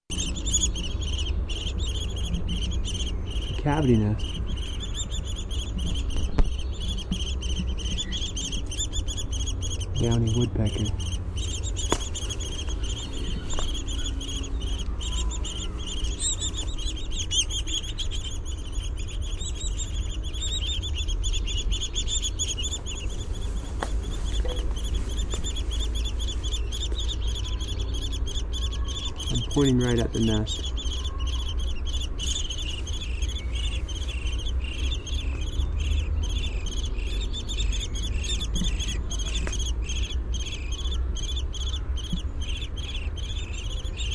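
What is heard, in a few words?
Downy Woodpecker pip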